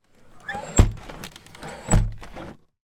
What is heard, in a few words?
household windows x2 close double glazed